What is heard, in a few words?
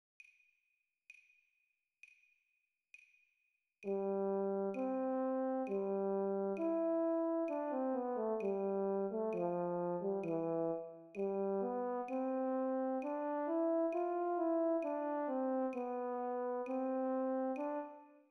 horn lyrical 66bpm